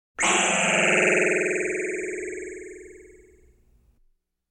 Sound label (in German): Laserkanone